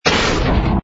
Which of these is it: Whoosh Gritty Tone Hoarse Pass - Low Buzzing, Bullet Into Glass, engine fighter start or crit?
engine fighter start